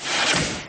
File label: tone woosh; sfx; WILLIAMS; INDIANA JONES; Indiana-Jones-Original